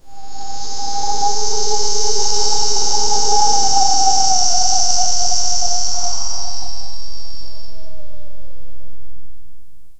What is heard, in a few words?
rain wind whispers soft, creepy